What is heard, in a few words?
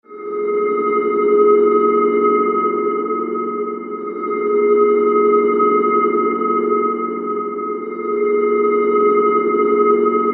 Science Fiction Drone Pulsating Alarm Slow; Sci-Fi; SFX Bible 6